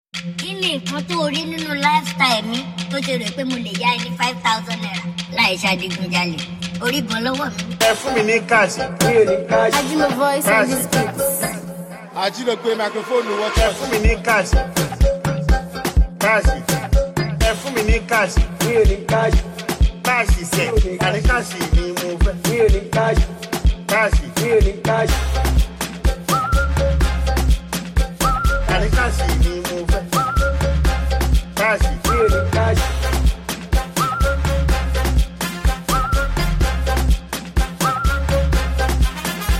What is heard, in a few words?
Dance Beat